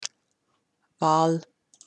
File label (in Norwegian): Wal